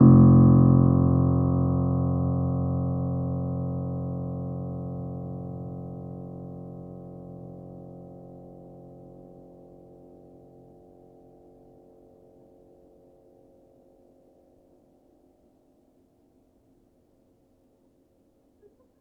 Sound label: Player, Upright Piano; HSS OP Pack; healing soundscapes